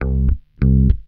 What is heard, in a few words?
BASS L, Roots Funk; USB Soundscan vol.41 - Roots Funk; AKAI